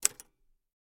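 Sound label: Typewriter Single Key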